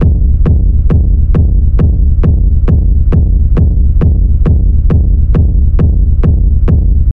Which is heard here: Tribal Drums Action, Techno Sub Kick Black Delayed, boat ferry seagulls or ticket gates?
Techno Sub Kick Black Delayed